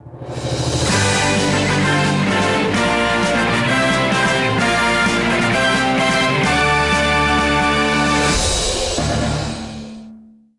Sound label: Victory Aria